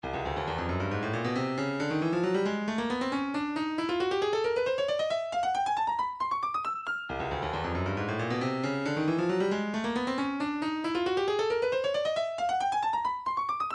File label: fm triangle